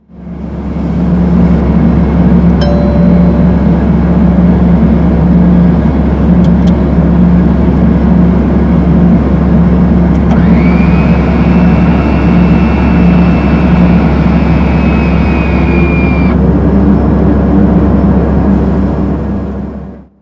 Jet Test, Aviation; KABOOM! - Wild and Wacky Sound Software

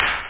hihat; drums

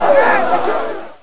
shout

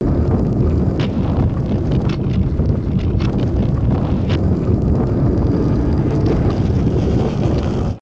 stone Grinder; SGI O2 Out of Box Experience; jungle Island; Raptor Builder

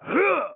attack; Dark Eden Beta Test

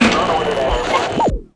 tuushin shot; VirtuaTennis

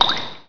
drip; PC Gamer IT CD 41; Daikatana

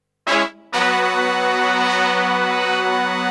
Synt; FUNKACID3

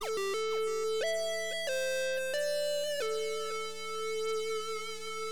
WORM, HipHop, Keyboards; LiveAct Pool 1

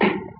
player ladder

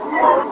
Jam Hit Loop; XWing

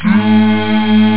duuhh

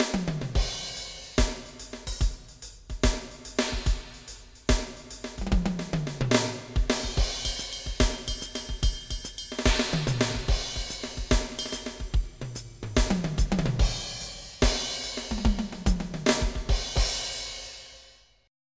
drums

